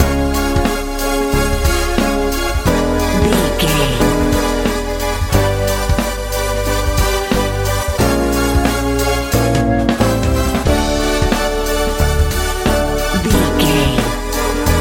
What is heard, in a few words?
alt hip hop